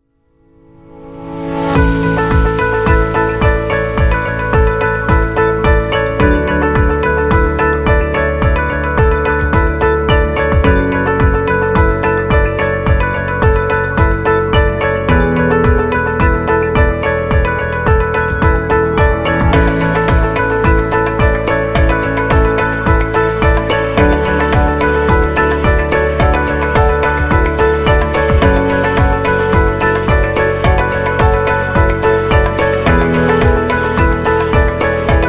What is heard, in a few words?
Informational; corporate music vol 18